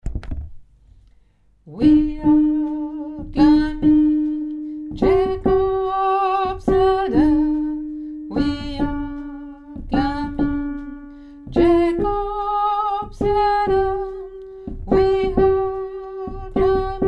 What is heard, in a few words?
mezzo; choeur